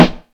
warm snare sound a sharp key, acoustic snare tuned to the A# note. Loudest frequency: 790Hz